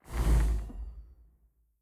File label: armor; smite; tiamat